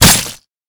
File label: kata hit flesh; garrysmod